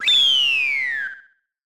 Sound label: Goal!; super mario kart gamerip